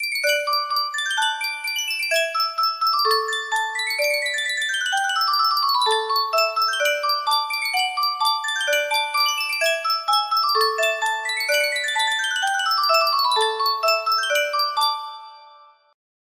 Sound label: sankyo music box